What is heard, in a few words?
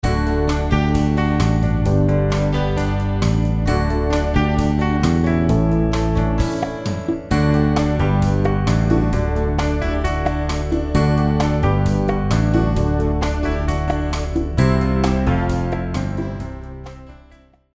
music sample contemporary